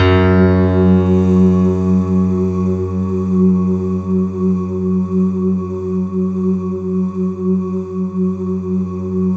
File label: F#2, Synth Line; 90 s Sample CDs; NiceBeats; SynthLine VOL-22